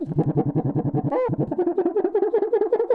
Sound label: cuica 162BPM